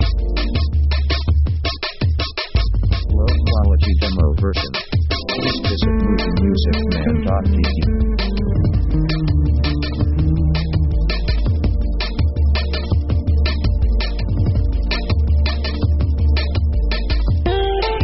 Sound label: mix india; synth themes